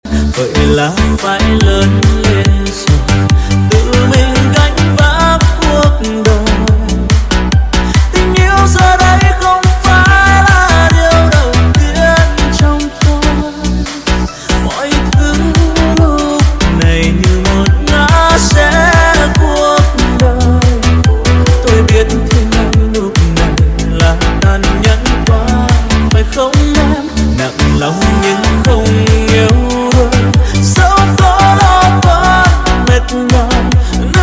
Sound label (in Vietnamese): Nhac Chuong Sao 30 Remix Full Bass